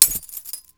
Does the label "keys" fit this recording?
Yes